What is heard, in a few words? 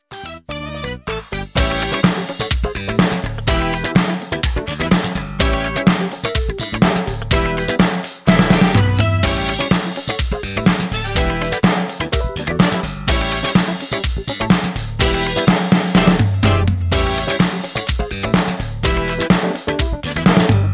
Funk